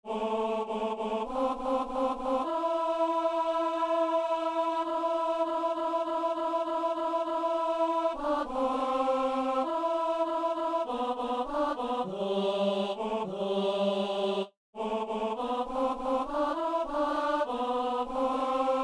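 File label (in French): ténor; Saint-Denis; Louange eucharistique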